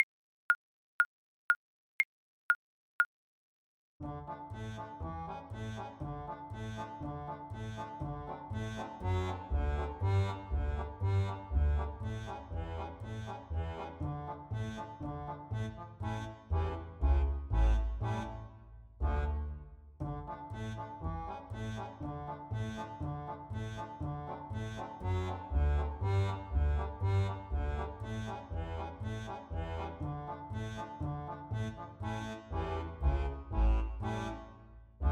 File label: Hevenu Shalom, Trombone